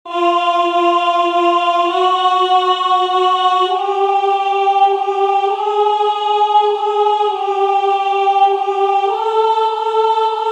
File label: Tenor